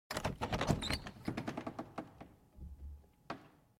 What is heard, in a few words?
Door Sound